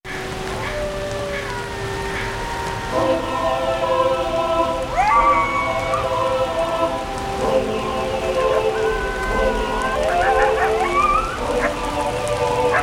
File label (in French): chant de fin du monde; projet carabanchel the three mothers